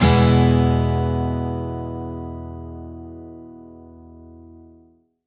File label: melee; sprunki horror mutant; boss